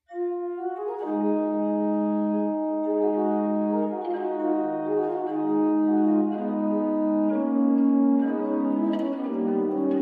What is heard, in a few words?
Gothic organ